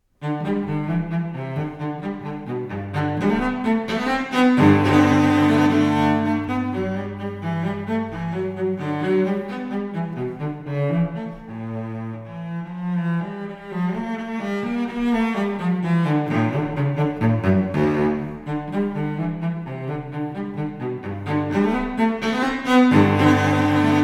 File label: Suite No. 1 in G major, Gigue, Cello; J.S. Bach; Cello Suites; BWV 1007